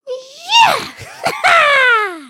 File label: happy, emotes